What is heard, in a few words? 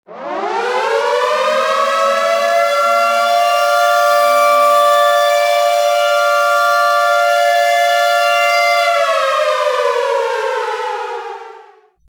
Sound, siren; Iransiren S20